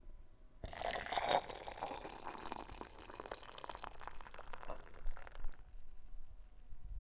Pouring Boiling Water Into Large Cup

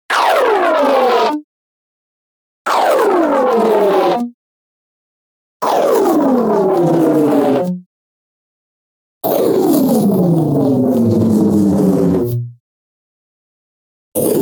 Growing Pipe S

